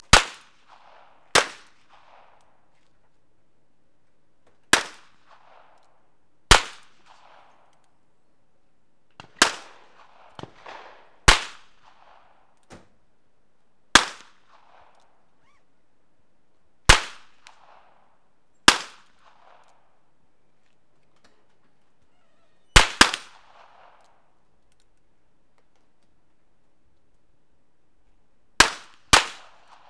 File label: GUNFIRE